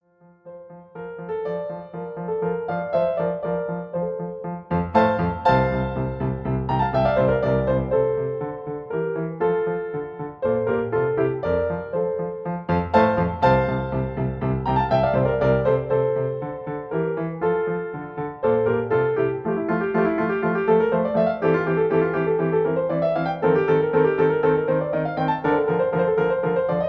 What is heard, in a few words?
Accompanment F; Laudamus te